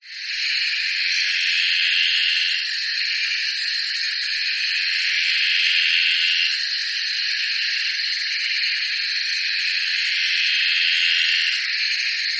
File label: insects frogs distant by forest lake night subtropical australia; animals